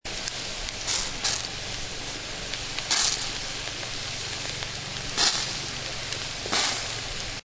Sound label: grill